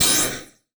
Multimedia Internet CD-Rom Flash Blast

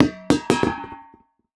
Impact Propane Tank Drop On Wood Floor

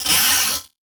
Multimedia Internet CD-Rom Flash Wipe Short

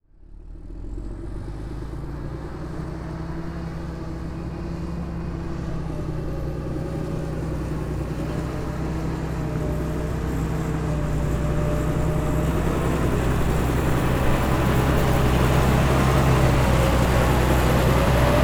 Bulldozer Driving By; transportation

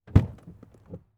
Transportation Jeep Commander Open Door Interior

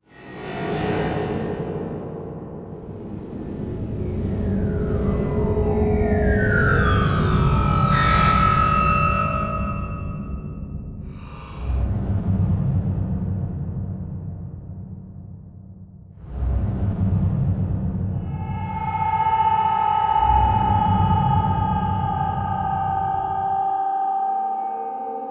Electronic Whoosh Reverse Feedback Digital

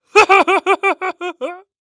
Cartoon Male HehHehHeh; AdobeCC

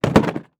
Industry Tool Box Put Down

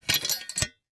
Sports Shovel Metal Compact Shovel Folding In

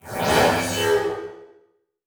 Transition Whoosh Alarm

